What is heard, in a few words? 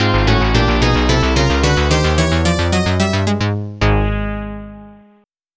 oil imperium action jingle; amiga